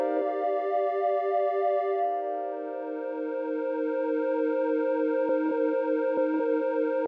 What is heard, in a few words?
Summer Night Pad; Proteus